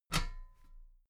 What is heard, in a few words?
metal ladder step soft